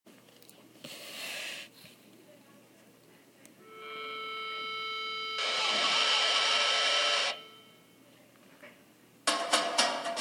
stress and sleep rap

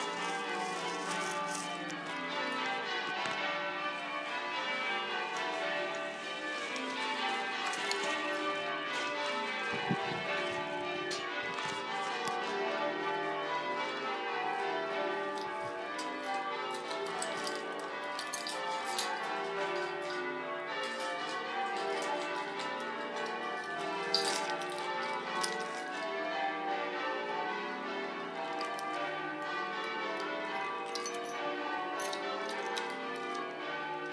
cathedral bells on whitsunday

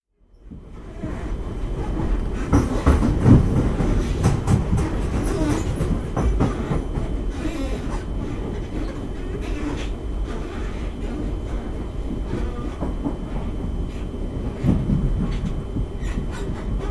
beautiful creaking train moving; between waterloo east and london bridge

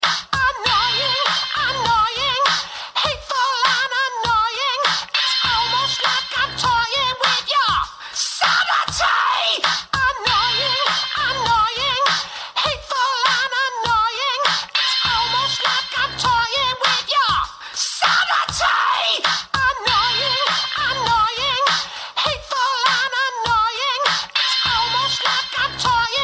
annoying hold music ringtone; Kevin Eldon

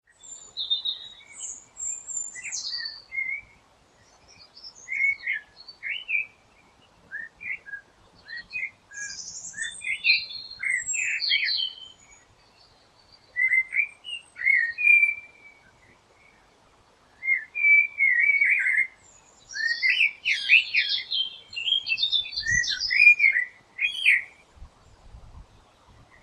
my natural alarm clock

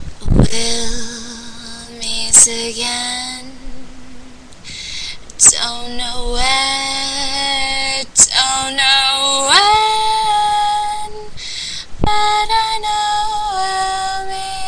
off key singing

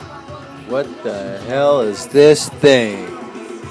huh

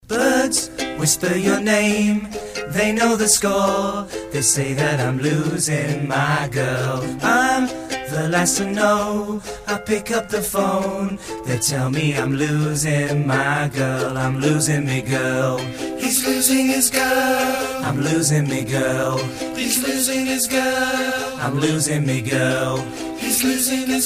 short song

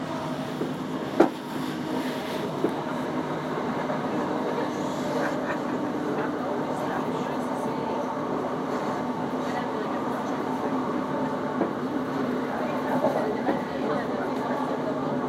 noisy train

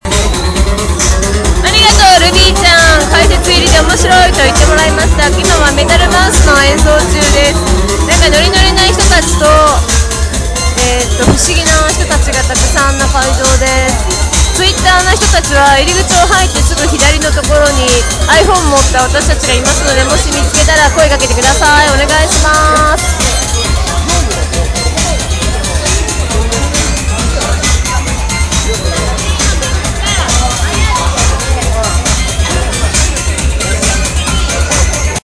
metal mouse

